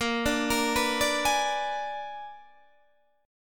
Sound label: Bb 7th Flat 9th Bb down Guitar Open D